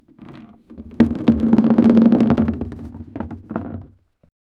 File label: a large heavy detritus ba; object manipulation